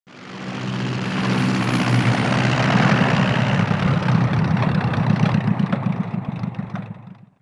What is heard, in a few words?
PROP; zones; Airport